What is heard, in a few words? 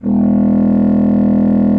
E1, bassoon; Xsample VOL-6